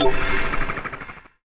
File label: Crystal; Sound Powerup Crystal Trigger